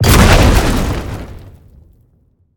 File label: fissure projectile; argus; smite; hera